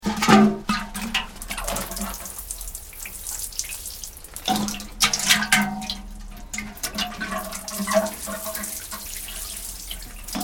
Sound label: Old tin watering can with sloshing water and sound of water sprinkling; Spraying; Objects